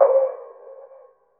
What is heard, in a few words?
crash L; Zero-G; Chemical Beats; CRASH ZOO